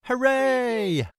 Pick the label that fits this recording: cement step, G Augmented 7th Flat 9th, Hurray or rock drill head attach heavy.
Hurray